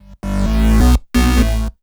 2 STEP 131 SYN, House Side Of 2 Step; USB Soundscan vol.51; AKAI

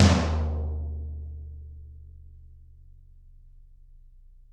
Toms, Drums; Bob Clearmountain Drums I

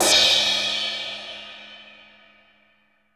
RESO RYD, Drum Kit; Drum Kit1

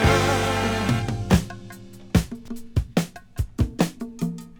breaks

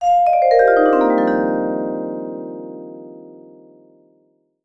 VIBE LICKS; Sampleheads - Dave Samuels Marimba & Vibes

